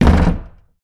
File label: kicking a wooden door